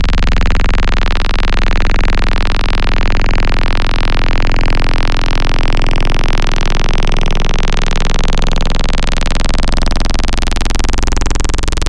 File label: Digital Super Dirt; ESW Digital; Synthetic's Serum Collection; ESW Core Wavetables; Echo Sound Works Core Tables